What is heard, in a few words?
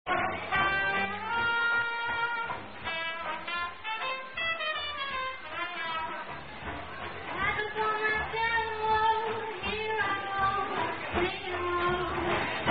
Jazz Combo, , Mailman Song; Knox College; I'm Your Mailman